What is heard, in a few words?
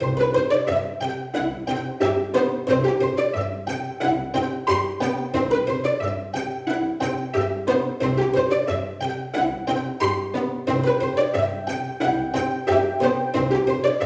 cheerful entertainment pizz soft vers; by burak sound bank; cheerful vol 5